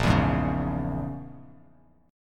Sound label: Bb Minor 7th Flat 5th, Bass Standard; 6,7,6,6; strummed; chord shape